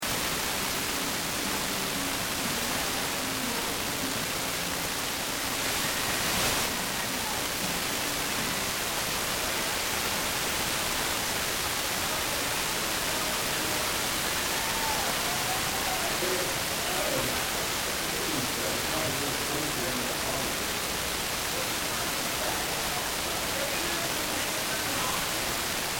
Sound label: TV Static Bad Reception Snow: Television very poor; Quasar; very poor signal; slight transmission coming through but mostly whi; Static Sound Effects